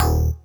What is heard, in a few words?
100 bpm DX7; HOLIDAY MOD STUFF